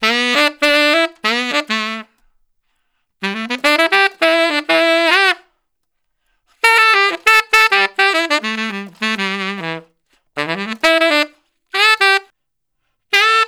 Tenor Solo, Jazz, Traditional, Tenor Sax

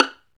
STR VL PIZ, Chamber Pizz; Roland L-CD702

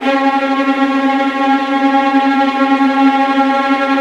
VIOLINT D#, Synclavier Strings; ILIO

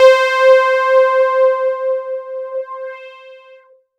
C4, Synth Line; NiceBeats

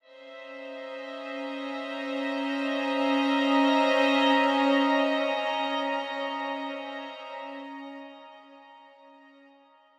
Strings, cinematic drama Soundbeds; musicradar

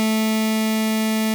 OB A4, VOX, Synthesizer; Hollywood Edge - Giorgio Moroder Rare Synthesizer Collection

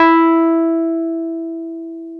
PNO Wurly; Roland LCDP10 Keys of the 60s and 70s 2; Wurly 200B

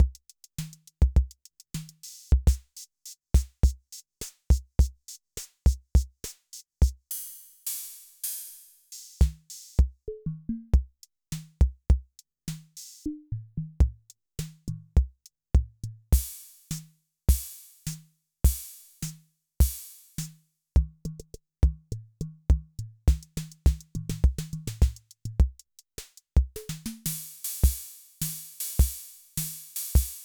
Yamaha MR-10

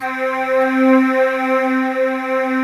DEEP BRTH, BREATHY; Synth

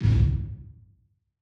Tails, Drum Bits, Verb Tails; layering

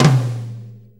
6-8 Tom Flam; 6-8 Loops; Flams; Drum Tools

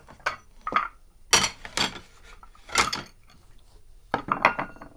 DISHES 2; AKAI S-Series CD-ROM Sound Library VOL-6

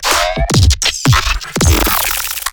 glitch stab; Virtual Riot; Glitch Stabs

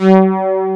MINIMOOG SOL; East West - Global Sounds; USA SYNTH

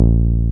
303, TB-303 BASS

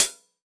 Closed HH

